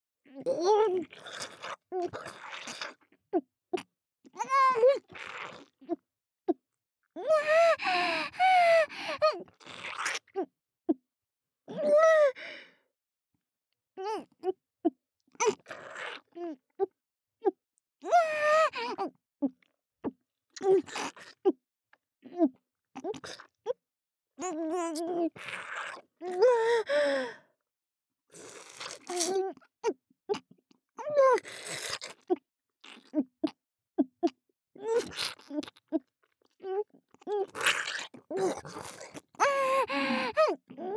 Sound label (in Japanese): 精液ごっくん【ループ】; ループトラック